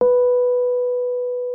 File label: B4, RHODES; Xsample VOL-1